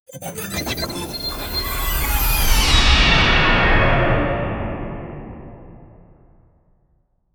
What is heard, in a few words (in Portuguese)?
confirmao sci-fi curta